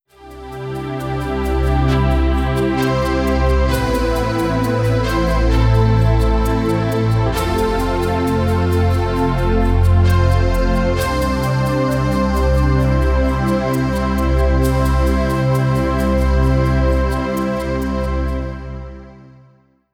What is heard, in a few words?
Cheers Anthem; Ryu ga Gotoku 6: Inochi no Uta